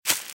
Velociraptor - Footstep - Grass - Run - Left

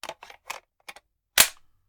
Machine Gun Foley; Sound Ideas; Guns

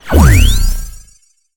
Sci-Fi, Shield, Device, Power Up; Blind Drive

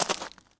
gravel; firefight

